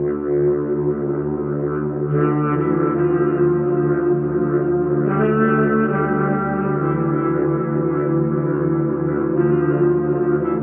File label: strings, Sad Loops